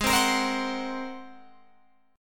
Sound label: G sharp 9th, Guitar, Open F; strummed; 20,19,22,19,21,19; G#9